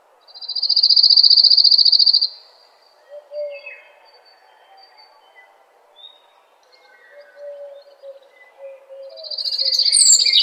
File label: birds singing ringtone